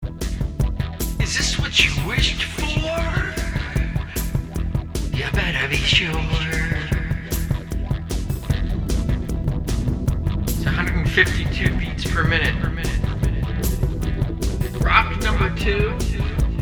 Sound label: Soundcheck